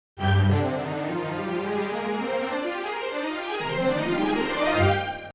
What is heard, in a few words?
strings; darth vader